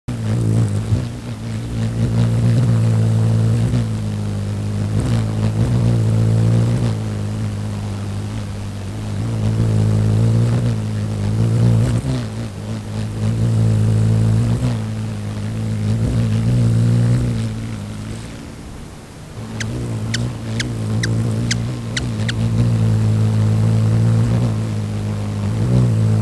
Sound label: Hummingbird Wing Sounds